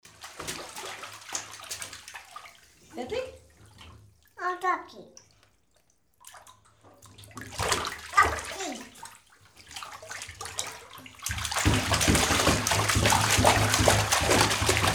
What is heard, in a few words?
small child bathing